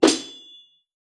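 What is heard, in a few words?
Barbarian base, 部署音效; 野蛮人; 绿野世界; 部落冲突; 皇室战争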